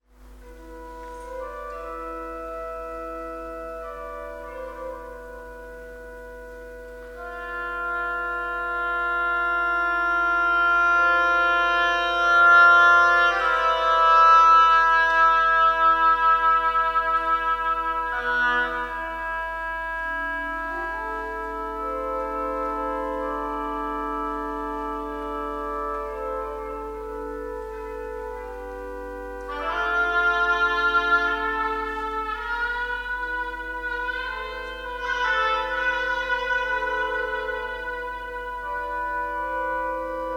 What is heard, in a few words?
improvisation